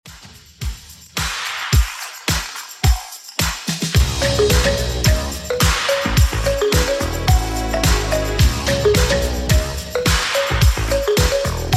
80s Redrum 108bpm

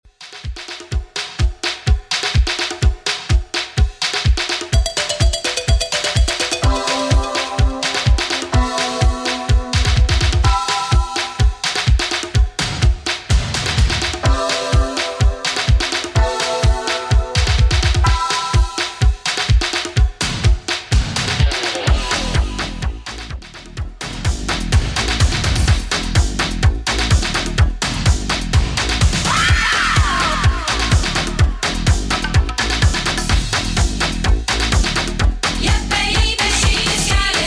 80s Redrum 126bpm

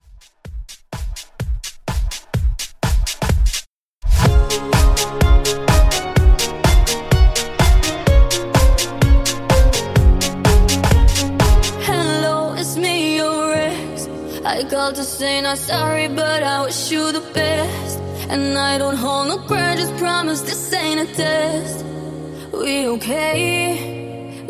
Future House Redrum

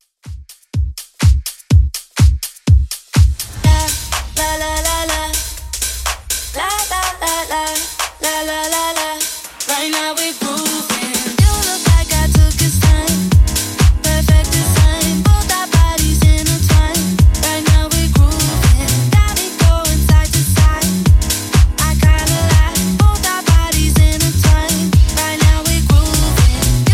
Top40 DJ Friendly Edit 124bpm

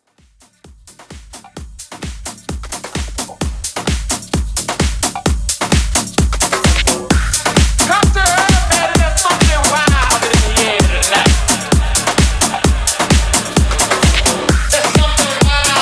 Afro House Rmx 130Bpm

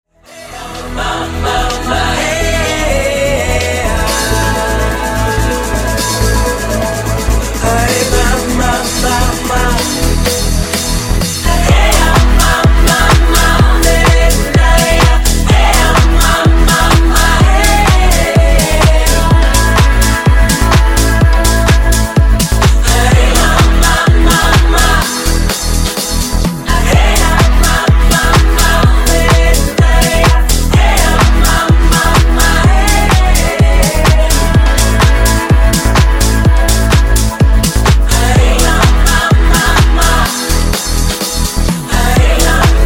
Funk Remix Clean 126 Bpm

